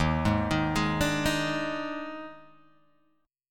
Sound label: Eb Major 7th Suspended 4th Eb, Guitar, Open G